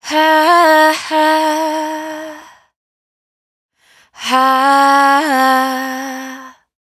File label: riff Breathy Hah 115 Fm, Vocal, Dry Vocals; Raw Vocals; Slate Digital Omnivox Vocal Sample Pack